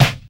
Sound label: rich low end acoustic snare C sharp key